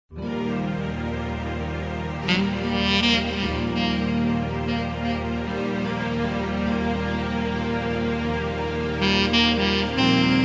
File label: Ballad